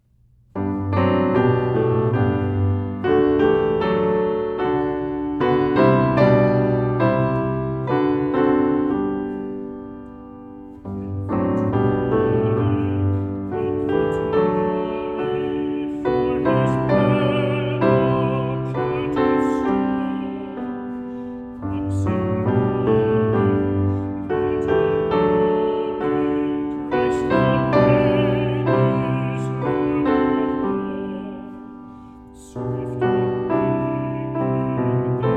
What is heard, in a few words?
Piano; Infant Holy Infant Lowly; piano accompaniment pieces